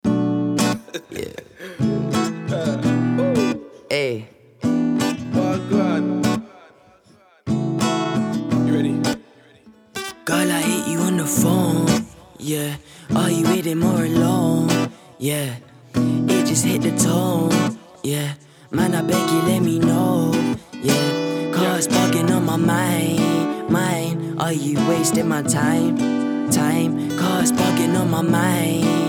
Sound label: Acoustic Jam